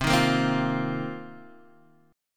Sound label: A sharp Suspended 2nd Suspended 4th C; strummed